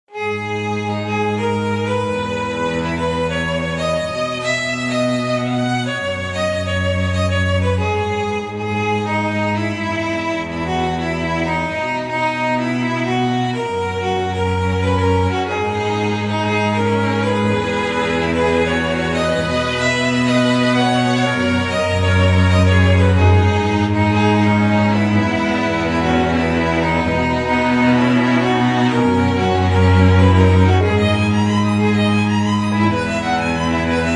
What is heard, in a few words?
song